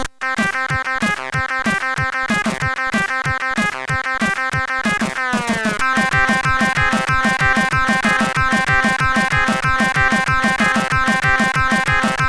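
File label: Techno, CSG8580R5; Techn0-2; hvsc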